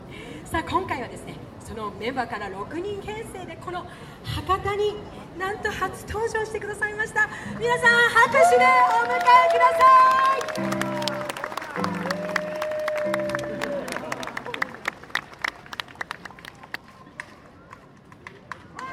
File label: jazz festival; fukuoka